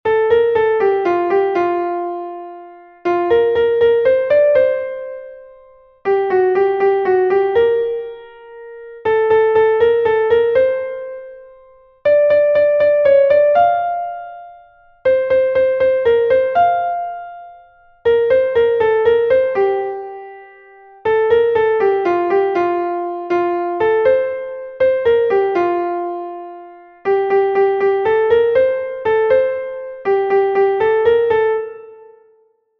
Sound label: Cradle Song, classical piano; Wiegenlied